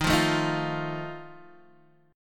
D sharp Suspended 2nd Flat 5th D sharp, Guitar Open D; chordshape